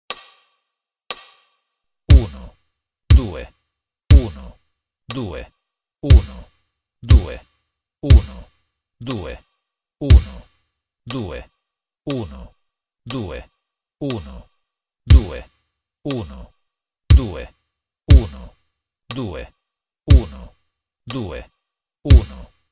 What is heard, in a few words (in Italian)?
esercizio ritmico